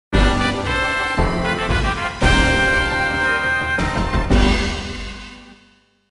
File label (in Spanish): Tema de victoria; Fox; SSB